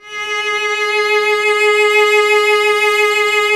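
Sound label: strings violoncello G#; Miroslav Vitous; Solo Instruments VOL-1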